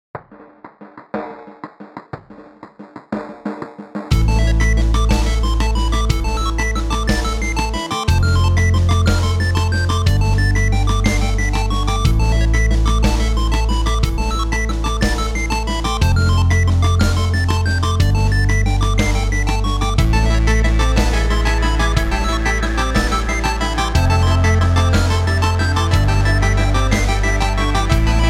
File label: jingle